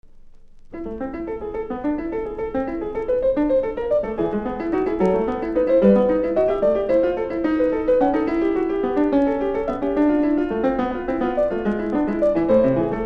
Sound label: Partita No3 Gigue; Bach; The Six Partitas; Glenn Gould